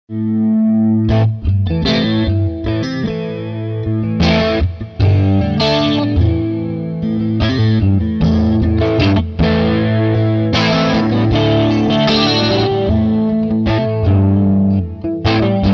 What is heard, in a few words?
Fender Bluesman